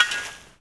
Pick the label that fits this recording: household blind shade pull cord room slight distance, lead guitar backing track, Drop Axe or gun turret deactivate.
Drop Axe